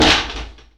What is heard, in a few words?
Metal Pusher